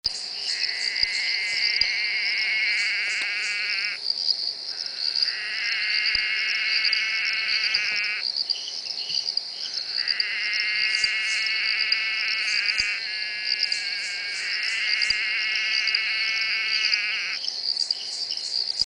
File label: Physalaemus olfersii